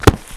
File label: book slam